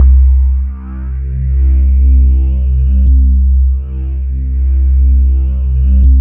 quartz C2, ethereal atmospheres; Soundscan - Ethereal Atmospheres

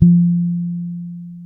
F 4, ragga, bass; Spectrasonics; Bass Legends CD 1

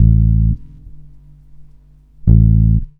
bass, Roots Funk; USB Soundscan vol.41; AKAI

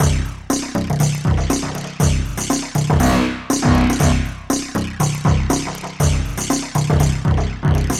Hi-Hat Esoterica, Beats

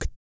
REGGAE, Vocal; ILIO; Vocal Planet; Blues & Soul

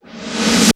Roland R-5 Back Snare, Drums

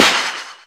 smash, Sound Effects

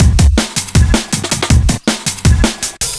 Drum Loops, 160; M8; Electronisounds

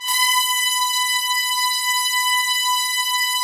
Trumpets; Roland - LA Composer vol. 4

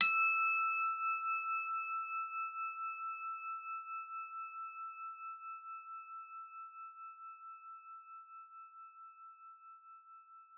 CRO E4, CROTALES; Xsample VOL-4

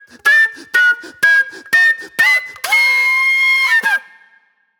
Jammy Flute

